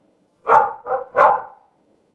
animal dog bark

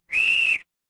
instrument whistle

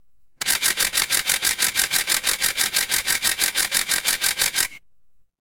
office camera

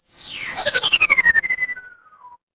transition sweep

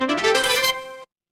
feedback level up

